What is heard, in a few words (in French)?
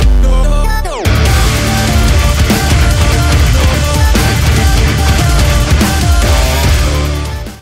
Guitare Electro